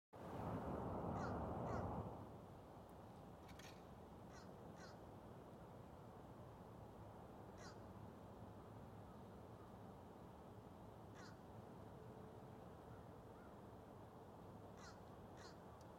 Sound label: Ruby-crowned Kinglet, Corthylio calendula, Aves